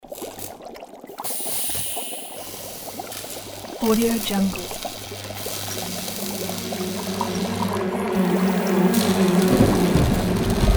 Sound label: mad scientists lab